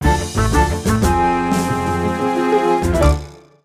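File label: Jingle Fail; Pilotwings Resort; 3DS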